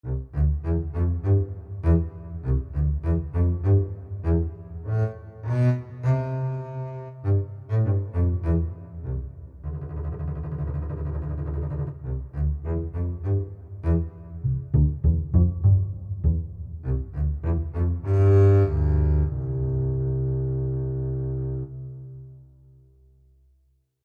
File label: Doublebass